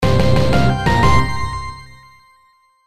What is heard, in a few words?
MUSIC COMPLETED